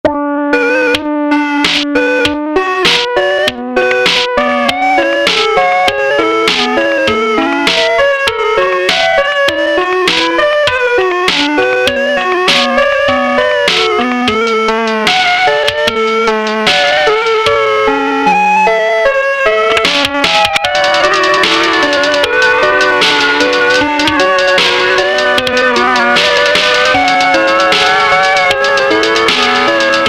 arpeg, retro future lo fi arpeg wave

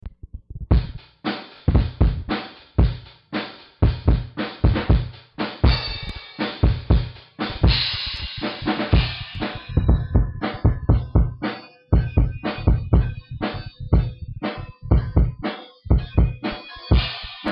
drum sample

